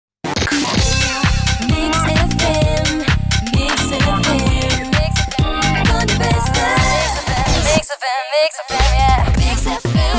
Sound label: station jingle